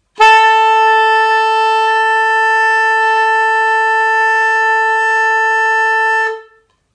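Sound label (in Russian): A of the first octave long, clarinet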